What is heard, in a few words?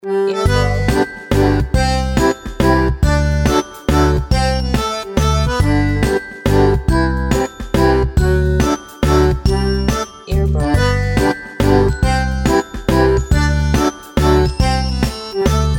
Waltz